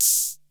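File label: KR33 open hi hat; Crypton - DrumBase5000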